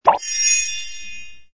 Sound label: prop find